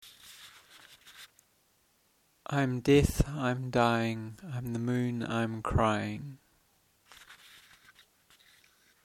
moon crying

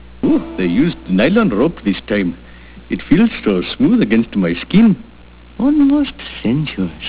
nylon

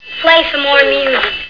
more music

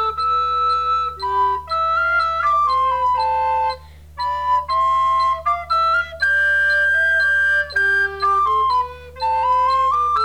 recorder trio

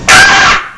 aaaaaahh

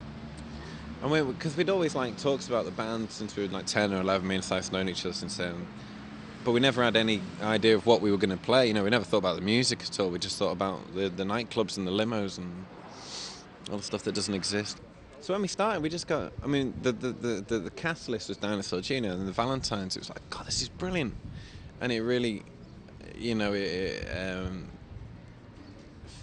boo

